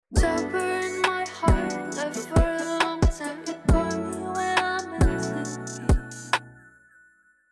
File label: vocal original; VocoderV